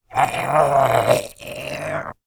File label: die; slender; zombiebm